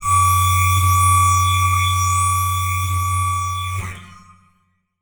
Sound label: Transition Stab Dark Electrical; Production Element